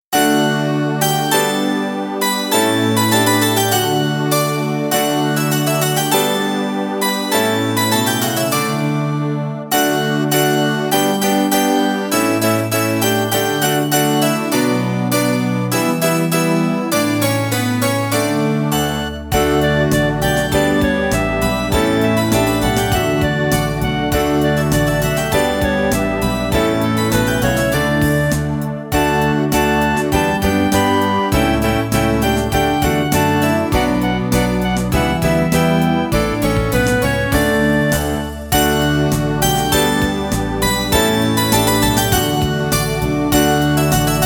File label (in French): VERSION KARAOKE